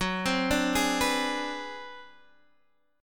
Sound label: Ab 7th Sharp 9th Flat 5th Gb, chord, guitar